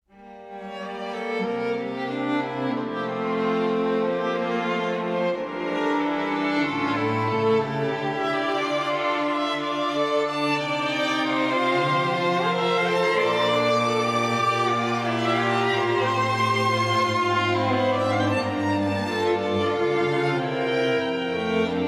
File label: elegant melody of string, alarms; Rollable LM R910N; LG